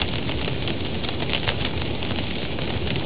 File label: fireplace; xmas